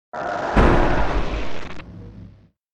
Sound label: attacking